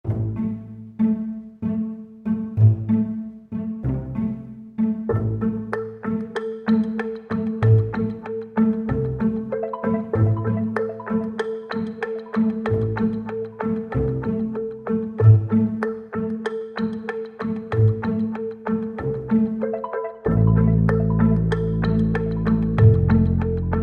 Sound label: Curious Steps